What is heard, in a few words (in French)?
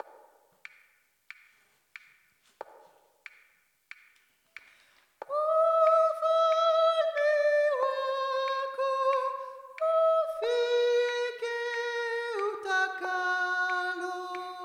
sopranes